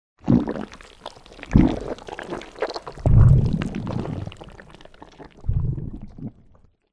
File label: swallow